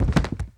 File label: BODY FALL, FIGHT; 1000fx